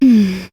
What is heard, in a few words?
doubt